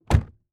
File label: Transportation Jeep Commander Close Door Interior